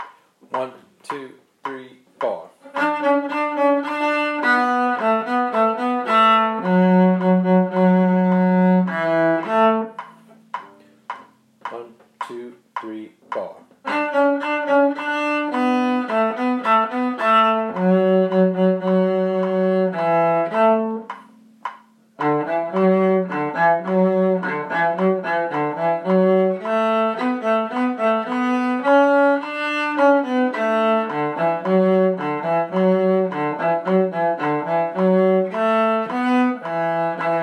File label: cakewalk violin